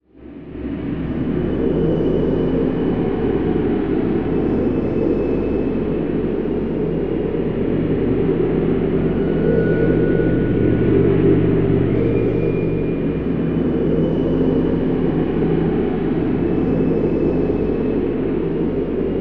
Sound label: Digital Ambience; Cinematic